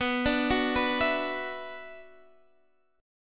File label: E 7th Suspended 2nd, B, chord